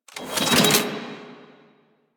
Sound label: Spear Gun Mech Recoil; Weapons; Warframe; Tenno; Hoplite